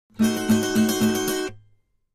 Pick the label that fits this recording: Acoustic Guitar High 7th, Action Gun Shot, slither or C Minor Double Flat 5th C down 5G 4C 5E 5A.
Acoustic Guitar High 7th